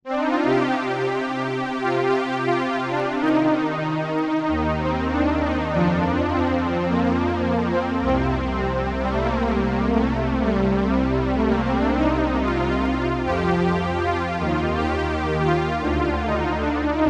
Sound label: echo flanger flanger e310u